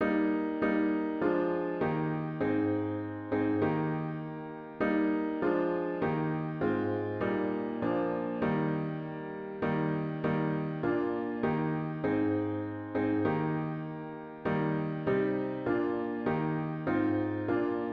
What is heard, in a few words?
piano with Intro; worship service